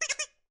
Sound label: quack